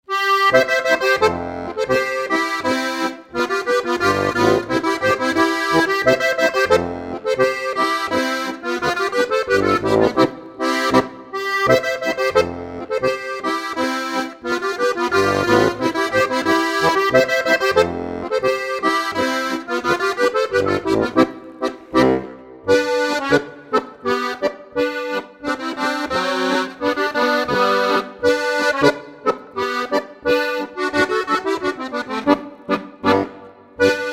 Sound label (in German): Boarischer; Lindenstueberl